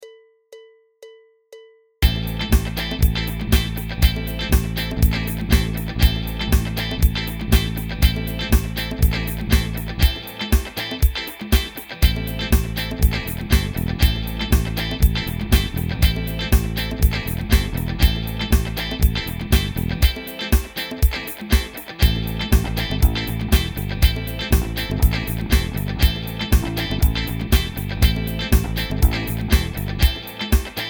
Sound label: impro am7